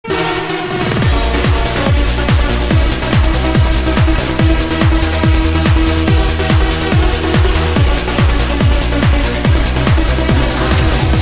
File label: Trance